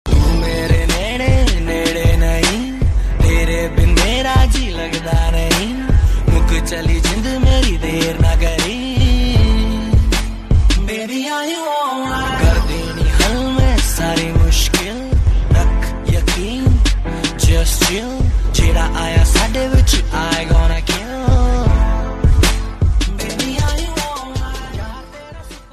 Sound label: ringtone, bollywood ringtones